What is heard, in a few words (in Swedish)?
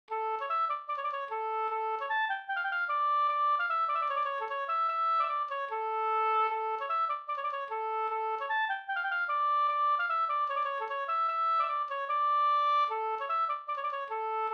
schottis; Lima; Ewert Ahs